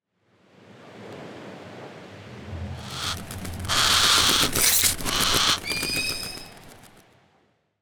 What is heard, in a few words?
Silver Hawk